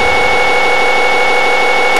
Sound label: minigun spin short; weapons